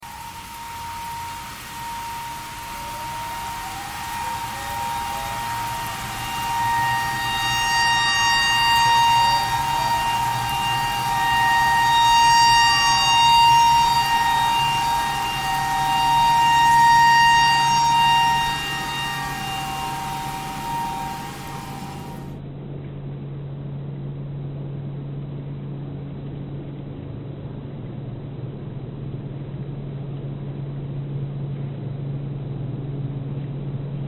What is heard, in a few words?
tornado siren tornado siren thread pentatonic